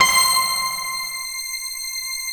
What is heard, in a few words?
WANDERER C5, SYNTH; Q Up Arts; Dream Experience